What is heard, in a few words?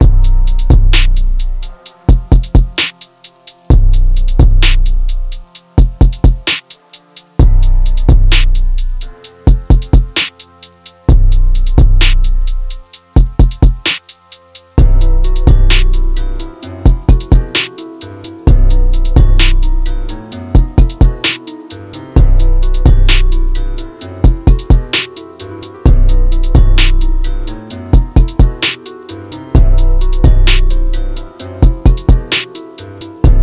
Instrumental